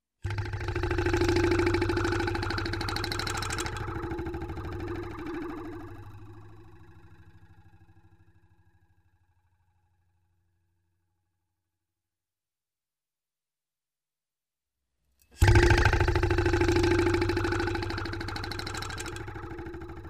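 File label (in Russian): вибрирующий металл разные варианты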